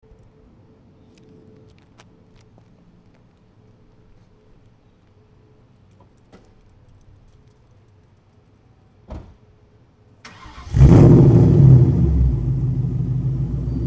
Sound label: Warm start and idle, Cobra BBK Longtubes